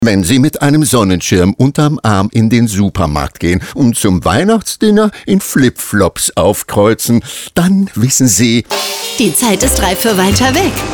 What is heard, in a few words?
Funk; EUROWINGS DISCOVER